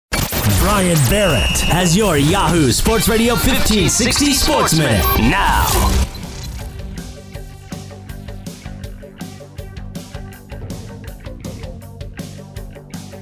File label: Update Throw and Bed